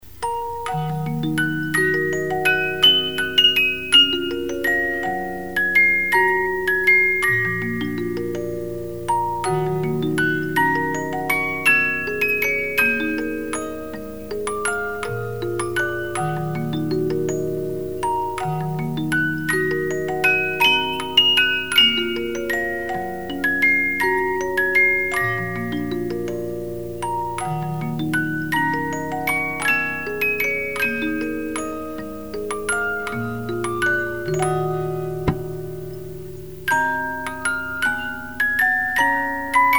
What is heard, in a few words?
Sicilienne, music box 50-Note